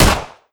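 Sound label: ar2 distant; weapons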